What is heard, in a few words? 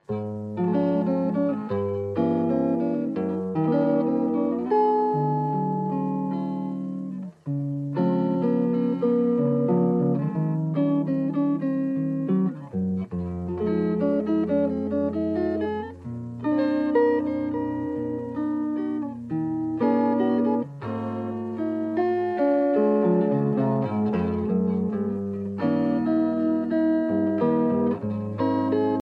calm alarm ringtone